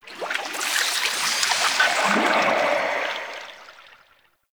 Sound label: Squelch Goop One Off; Warframe; Tau; Ambience